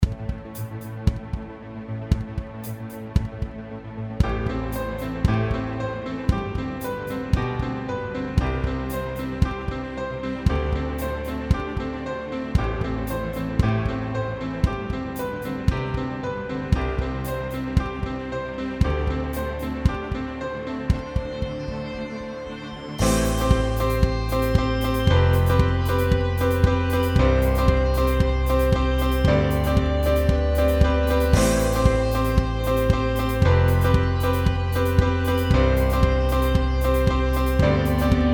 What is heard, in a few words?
new song